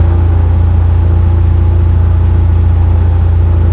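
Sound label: zlin cockpit left, engines; War Thunder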